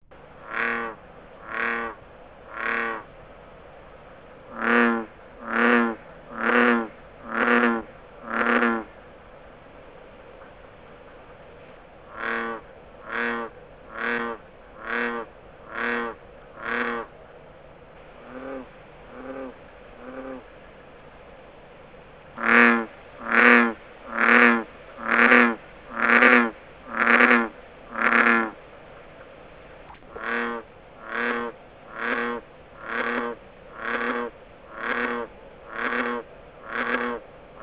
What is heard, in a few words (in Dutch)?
paringsroep